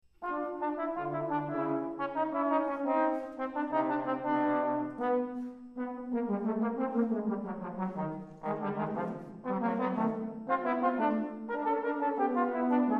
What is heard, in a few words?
trombone